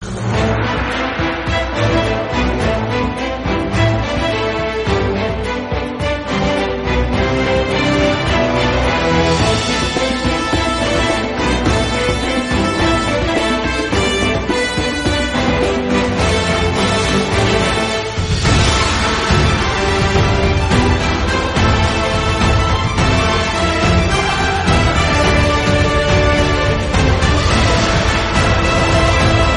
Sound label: drums; one piece